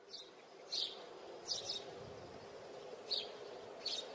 kuş sesi

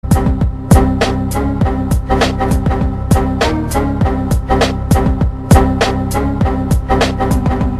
windows uyar sesleri remix

